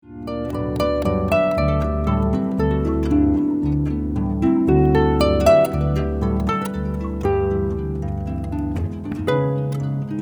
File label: SLEIGH, Jazz Harp Christmas